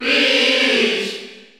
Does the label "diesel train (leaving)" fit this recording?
No